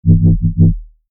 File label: 808 Slide